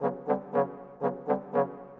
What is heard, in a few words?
Trombones Tuba, Orchestral; Sonic Foundry (Sony Creative Software); Orchestral VOL-2; Modern 11